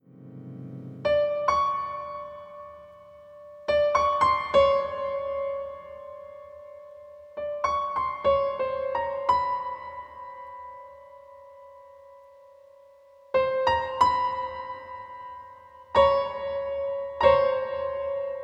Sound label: Piano